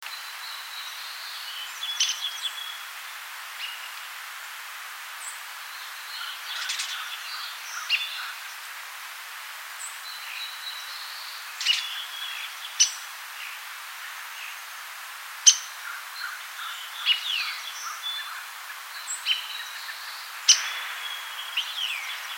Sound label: Downy Woodpecker Dryobates pubescens; Montana